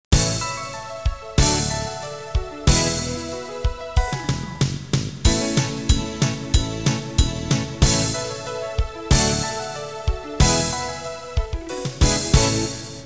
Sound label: country; QChord